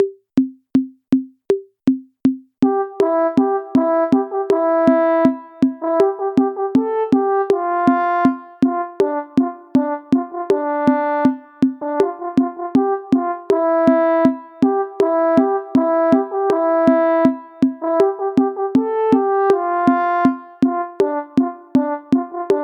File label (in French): Trompette Sol; Eliott